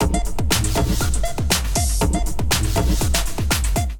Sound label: Fog Juice Kit groove 120 bpm